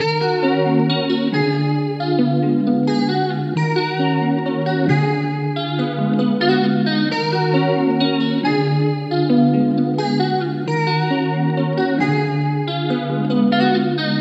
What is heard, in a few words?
guitar loop 90 Ebm; killa